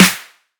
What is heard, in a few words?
crisp snare one shot f sharp key